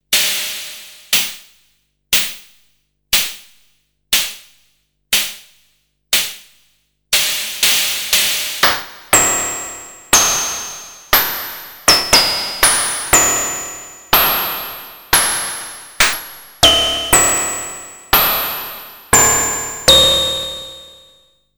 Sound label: percussion